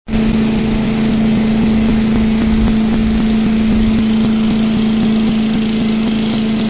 mower

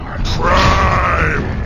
yells; prime; megatron

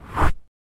baseball

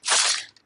claws garras; wolverine

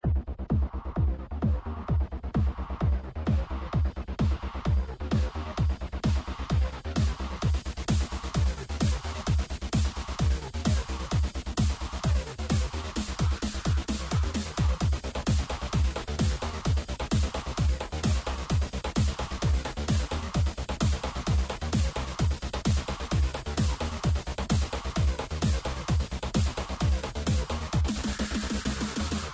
percussion; virtual dj

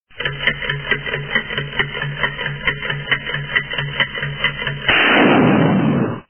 time bomb

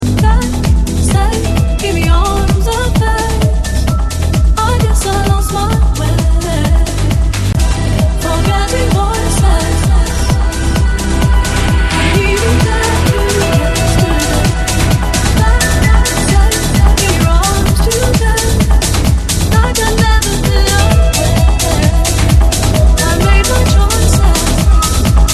ringtone

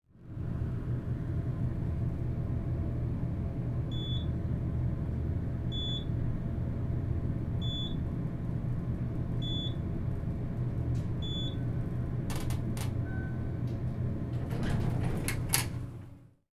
Elevator Ambience

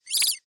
Button Click

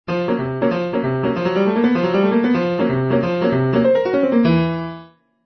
PIANO COMEDY

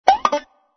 BANJO COMEDY

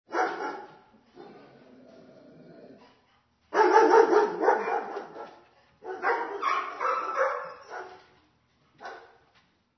ANIMAL DOG SHEPHERD

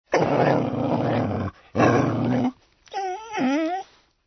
ANIMAL DOG MIXED

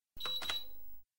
computers keystrokes bleeps

